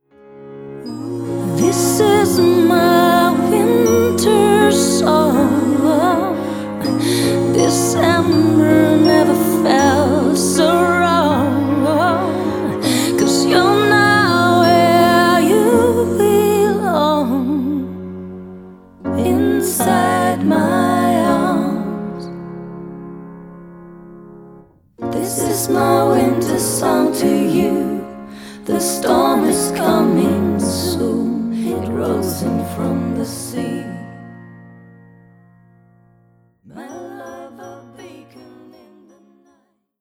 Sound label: Female Voices Winter Song